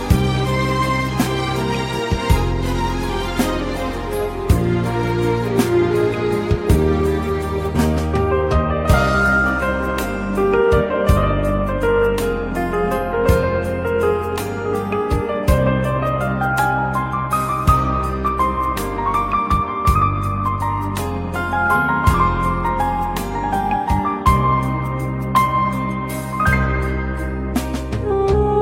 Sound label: Flute